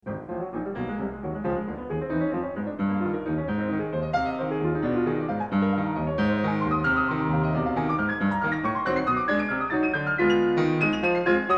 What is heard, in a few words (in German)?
Carnaval für Klavier; Wilhelm Kempff; Op 9; 20 Pause